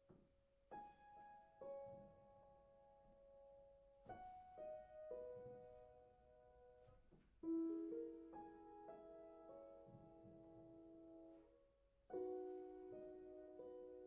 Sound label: cloches de geneve